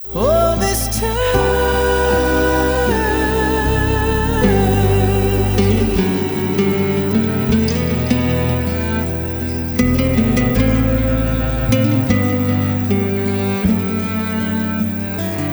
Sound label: 8bits